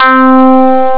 stab; Pirates; ST-XX Amiga Soundtracker Sample Packs

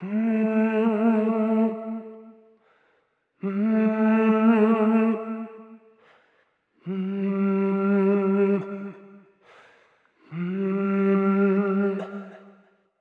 Soul Vocals Am (Wet) 140 BPM, Melodies; 99Sounds x Monosounds

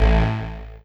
Synth Hit A; Trance; Synth Hits; Zero-G Trance Inducer; 90 s Sample CDs

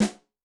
Fusion, Yamaha DTXpress-II snare

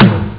bong; robocop; ST-XX Amiga Soundtracker Sample Packs

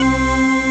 organ, elixia organ; Best Service ProSamples vol.10; House; AKAI

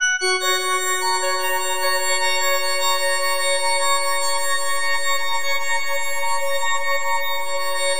FM F# MAJ; Bolder Sounds

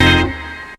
tuners

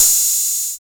606 OHH, HIP-HOP; Drums In Your FACE!

